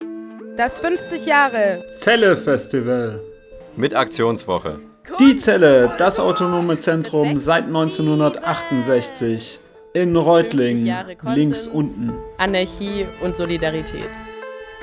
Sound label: JINGLE; ZELLE